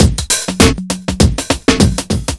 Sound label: shufflin beat; Sonic Foundry (Sony Creative Software); Ugly Remnants VOL-2